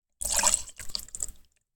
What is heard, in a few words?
water